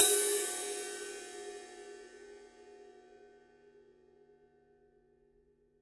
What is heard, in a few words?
brass 20 ride body